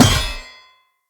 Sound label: Blunt Heavy Impact Metal; Weapon Impacts; Player; Environment